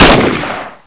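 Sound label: hk416 unsilenced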